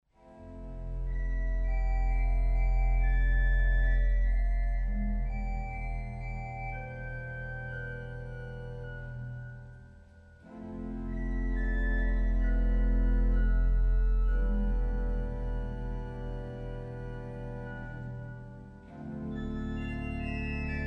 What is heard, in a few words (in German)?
Orgel